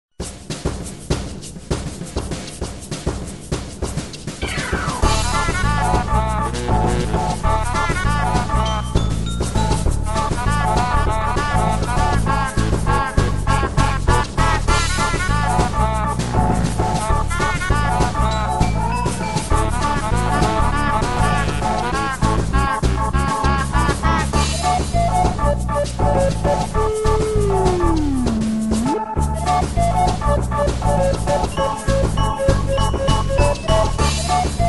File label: fight music; dota